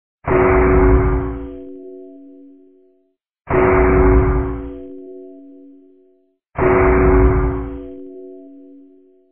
building; vapesquared

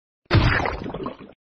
splat; reversevape

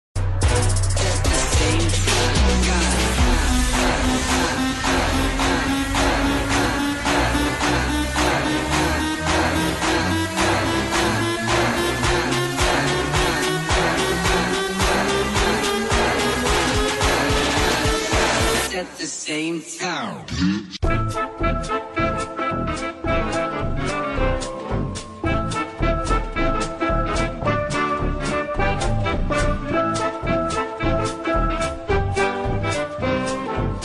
bass drop; reversevape

